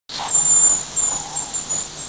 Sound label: red grouse, birdsong